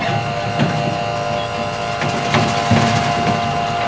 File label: electric motor loop